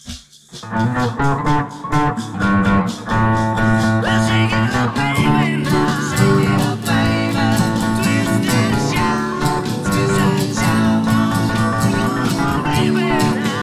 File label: Lead